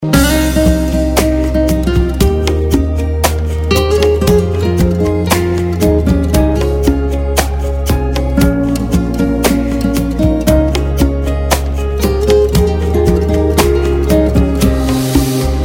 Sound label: New Classic Music